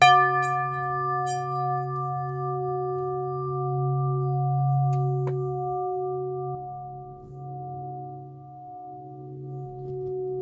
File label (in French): Harmoniseur OM